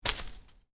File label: Foliage hit soft, Foliage Impact, Soft Foliage Hit; Miscellaneous Impact